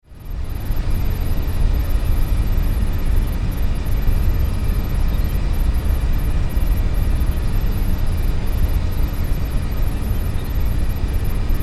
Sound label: Science Fiction Computer Room Beeps Readouts Fans; Computers